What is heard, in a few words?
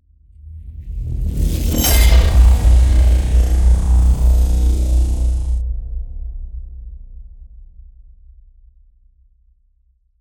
Cinematic Hit; SoundBits; Cinematic Hits & Transitions 2